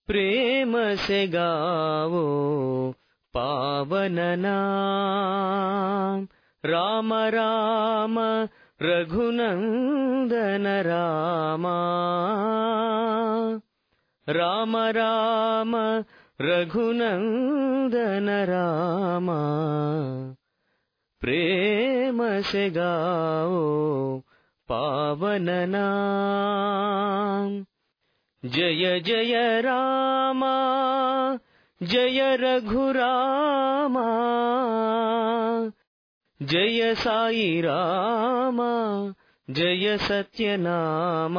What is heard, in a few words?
Prema se gavo tune